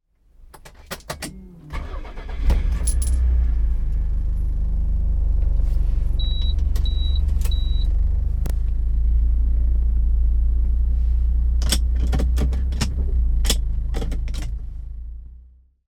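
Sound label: Figaro engine; Chino Cultural Complex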